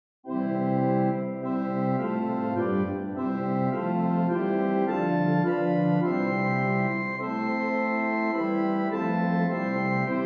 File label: Organ